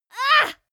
female moan pain short soft; koerpergeraeusche; menschen; soundpool

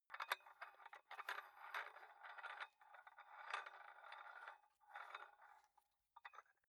dumbbell turn nut thread slow; sport freizeit; fitnessstudio

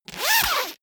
zipper pullover jacket open fast

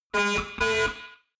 sci fi alarm horn cackle double; soundpool

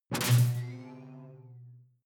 sci-fi alien splash glass; swooshes-swishes

